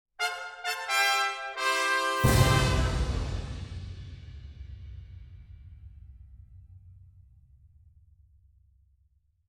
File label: Fanfare; rise of the guardians